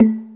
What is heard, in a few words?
Electro FX; Ueb HE Electro FX